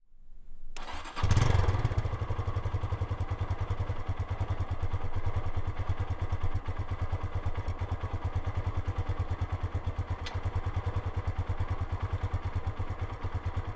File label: Motorcycle - Karizma - Electric Start - Slow Speed Pull Away - Indian; The AudioVille; Motorcycles of India